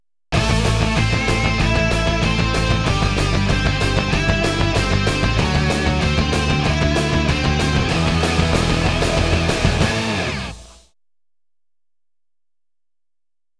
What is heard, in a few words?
Instrumental Theme; Power Rangers in Space; Fox Kids Countdown